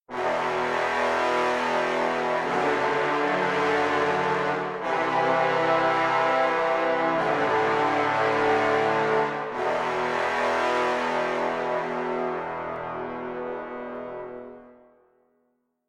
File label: trombones